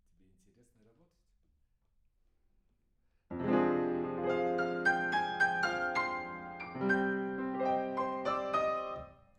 PIANO; TANGO

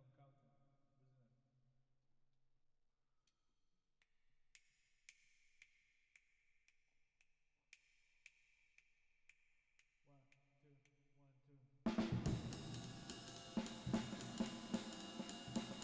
Drums Verb

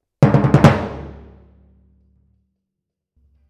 Timpani

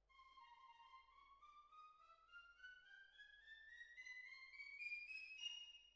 Cbasses

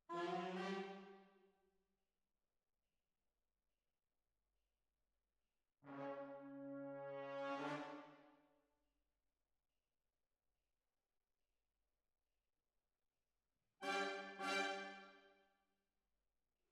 Hall

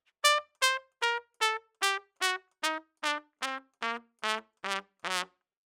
Trump

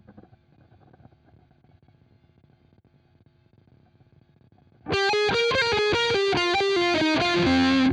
Guitar

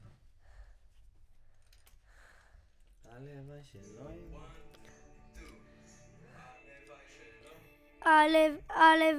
VOC

